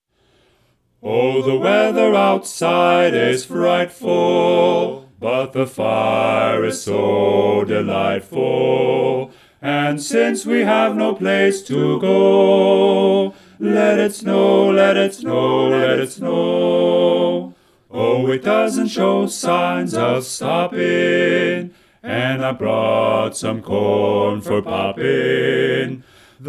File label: bass right